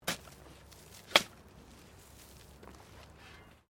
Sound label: Digging Shovel Sand